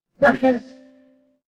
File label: ui app open